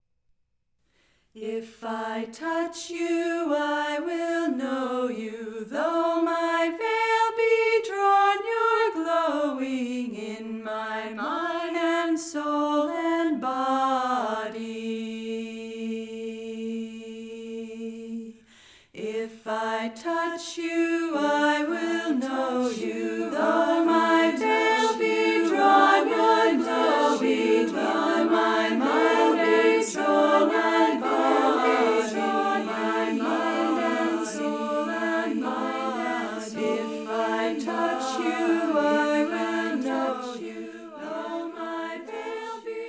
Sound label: Chants